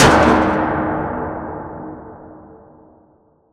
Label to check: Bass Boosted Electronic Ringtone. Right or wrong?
Wrong